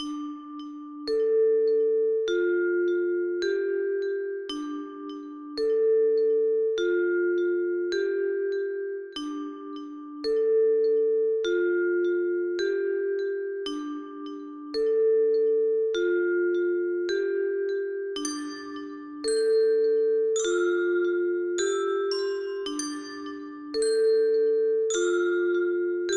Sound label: wilderness calm ice, music